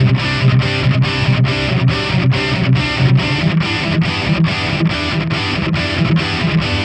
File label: Distorted Guitar 140BPM Emin; Vengeance - Essential Clubsounds Vol.4